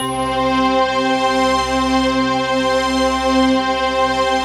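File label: C 4, BELL STRINGS; Best Service; Advanced Media Trax Modern Composer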